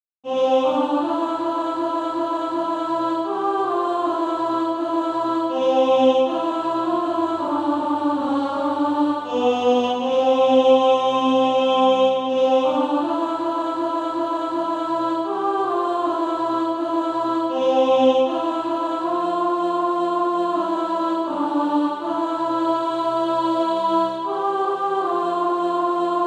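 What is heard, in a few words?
alto, Practise Music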